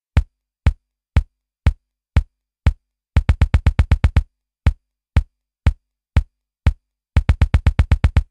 DMX PATTERN

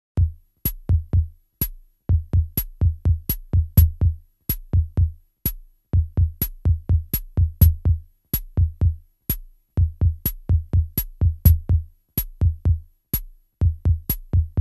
E-H RHYTHM-12 ROCK

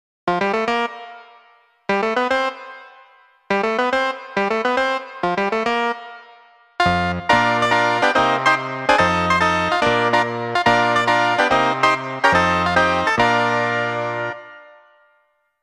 Harpsi

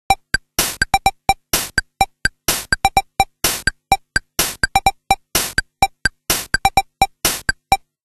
CASIO VL-1 ROCK

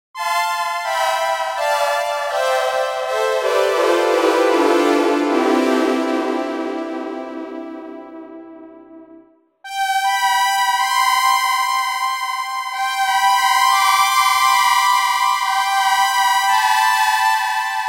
PolyGlass

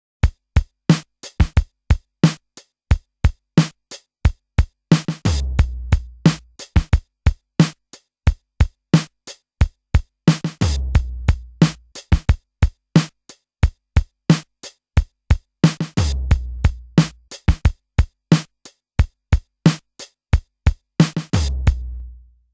DMX PATTERN

